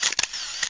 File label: Camera Shutter